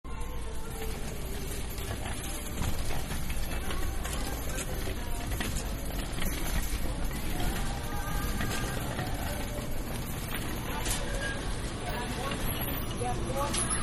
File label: Supermarket-Walking Down Isle With Shopping Cart; Stores And Mall; Manhattan Production Music